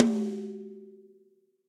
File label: Tom High Studio Basic, Drum Hits; Standard Hits; Ableton; Factory Packs; Session Drums Studio